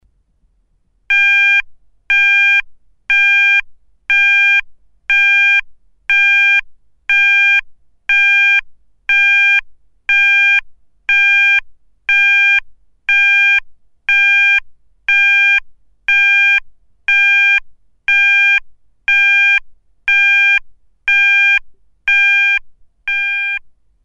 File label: PIPS